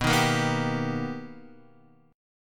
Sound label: B Augmented Major 7th B, chord; strummed; JGuitar